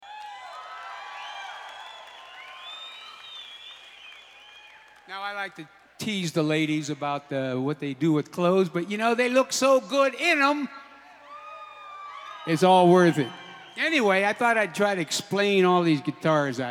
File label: Guitar Moment